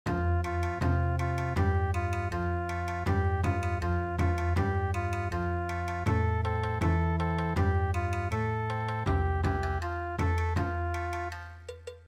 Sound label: Bon Odori